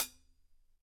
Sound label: HH Closed, EMU PX-7 Drum Machines